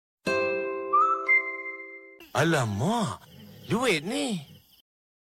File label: ringtones